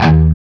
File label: CHUG D#1 L, Guitar; East West; Steve Stevens Guitar Samples Collection